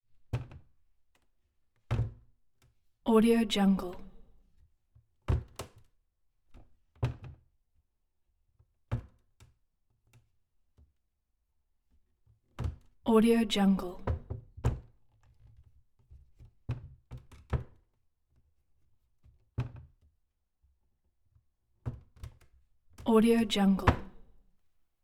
cabinet door kitchen wood open close

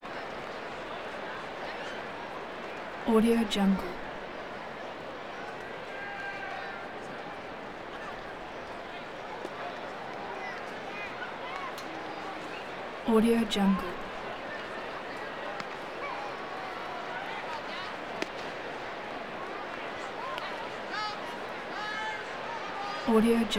baseball crowd yelling man kids voices

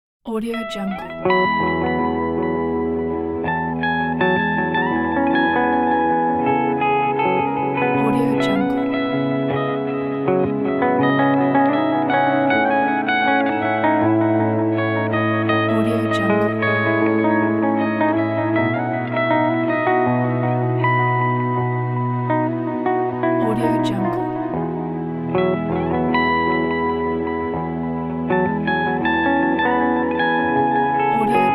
ambient intro

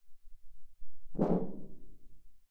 metal impacts

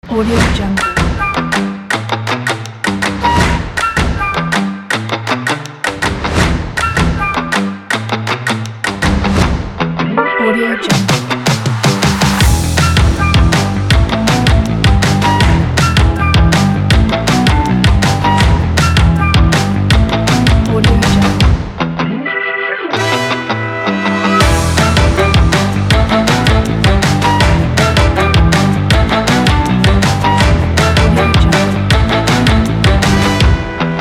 upbeat funk beat

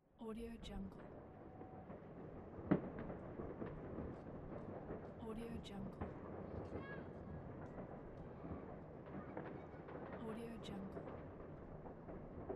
a new year fireworks

